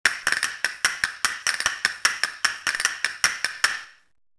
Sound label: castanet